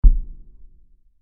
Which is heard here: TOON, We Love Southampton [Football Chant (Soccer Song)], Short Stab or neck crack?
Short Stab